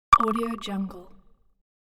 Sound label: high beep with echo sfx